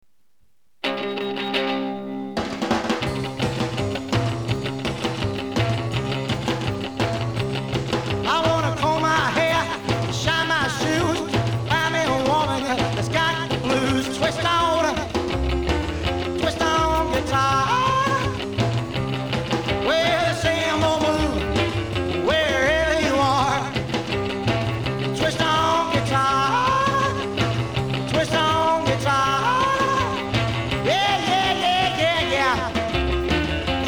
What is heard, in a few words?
Twist On Guitar